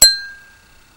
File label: ting